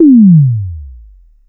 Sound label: TOM KIT C4, TOM SYNTH; USB Soundscan vol.20 - Fresh Disco House I